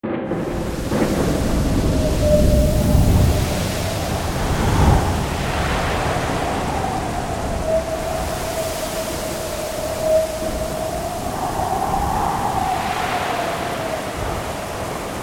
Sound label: Storm At Sea, Thunder, wind rain and crashing waves.; Weather